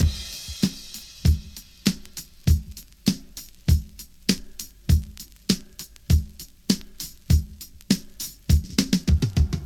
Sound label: 100 bpm high quality drum groove d sharp key, Free breakbeat - kick tuned to the D# note